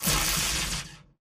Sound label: bullet energy titan arc; Titanfall 2